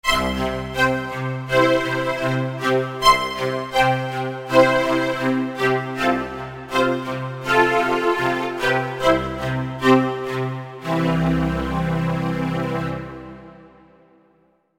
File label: SBF Arco, V-Synth